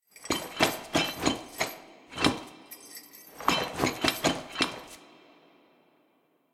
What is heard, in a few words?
walk backwards look phase